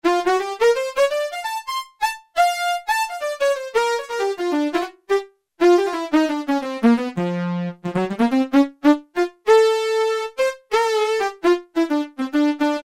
SAXY LEAD, Casio VZ-10M; VZ ROM Card

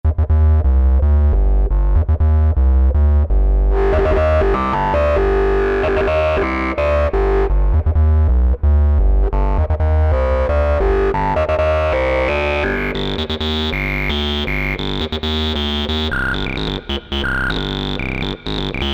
3oh3 Sqr MW, Alesis Z2 EuroDance

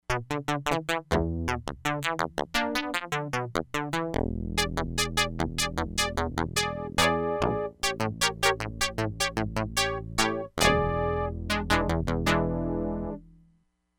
CLAVINET; Factory Programs

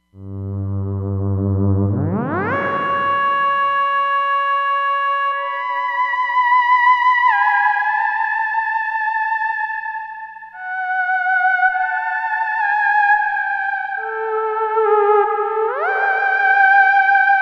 Theramax, Roland Fantom XR

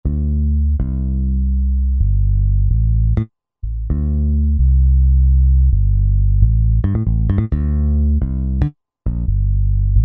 P.Bass; Roland SR-JV80-10 Bass & Drums

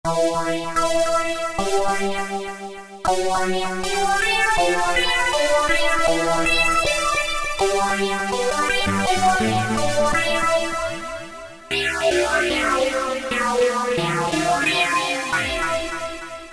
Healing, Korg MS2000; Factory Patches

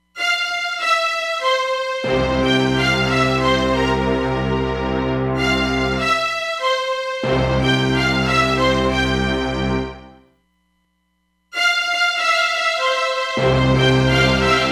Panning Delay, Roland DEP-5; Factory Patches

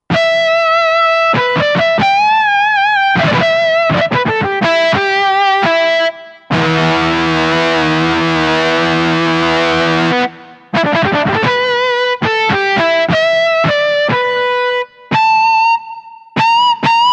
Crunch Tone, Roland SR-JV80-09; Roland SR-JV80-09 Session

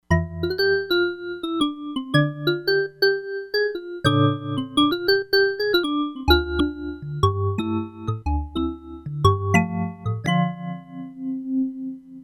VIBRAPHONE, Crumar Bit 99; Factory Programs

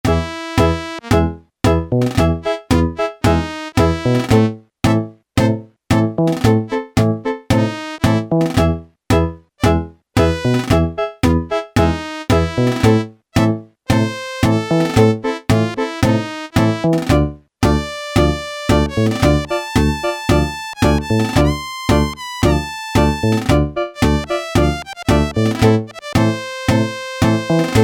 TANGO, Yamaha SHS-10; Factory style demos

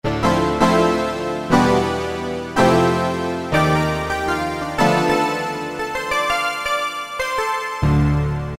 Decay, Yamaha TG33; Factory Presets; Preset bank 1

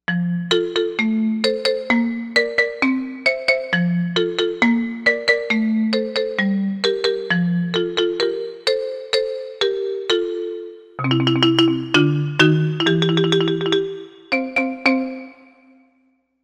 Marimba, Korg M1Rex; Factory Patches

